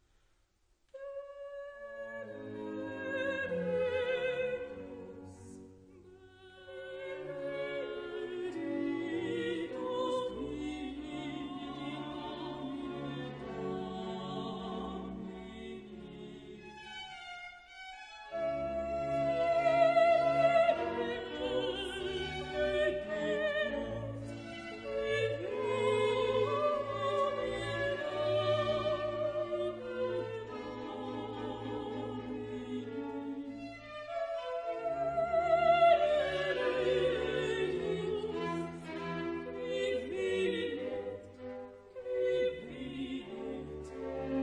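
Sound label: Missa brevis in D minor Benedictus; Mozart; KV65(61a)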